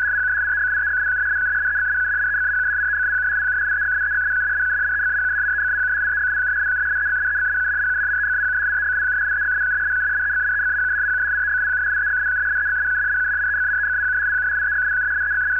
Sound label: 108-87 Bd IDLE, United States intelligence 108.86 Bd FSK system; US-INTEL 108.86 Bd FEC in idle condition; US-INTEL FEC-SYSTEM 108.86 Bd